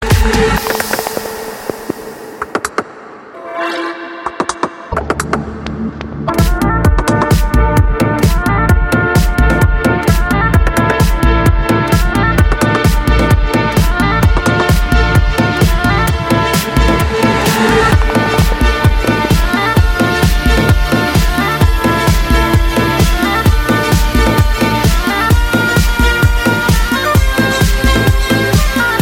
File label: Deep musique remix